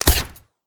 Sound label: pew